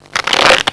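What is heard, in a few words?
SHUFFLING; HAUSHALT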